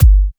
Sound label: kick sealed